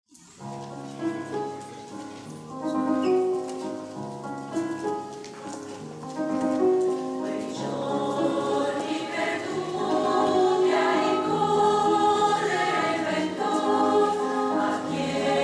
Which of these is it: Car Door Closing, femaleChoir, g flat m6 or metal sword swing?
femaleChoir